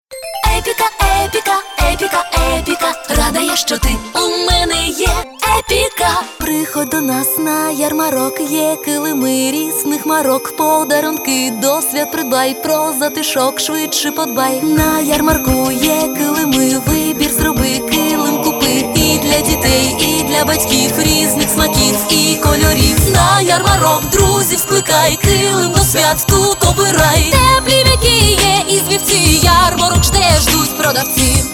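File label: vocal, Ukrainian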